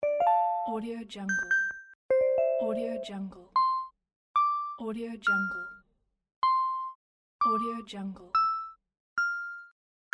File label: digital beep